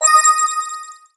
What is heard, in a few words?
Menu Effect; ASharedJourney; go-opengl-pixel